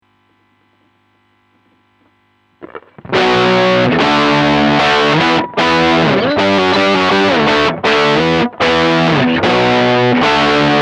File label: Lead